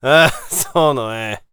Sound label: warrior conversation emotion laugh; gamerip